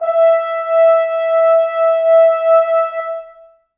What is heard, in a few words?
brass acoustic, muted trumpet